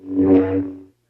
Lightsaber

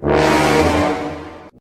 Suspense Medium

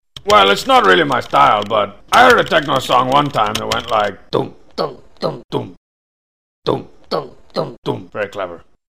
techno song

